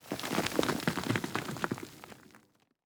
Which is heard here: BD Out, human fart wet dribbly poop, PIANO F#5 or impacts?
impacts